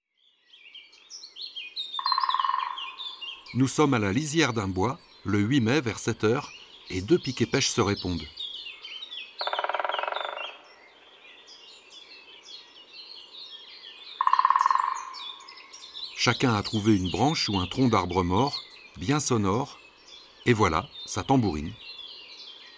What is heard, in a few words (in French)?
Pic epeiche, ABC des chants d'oiseaux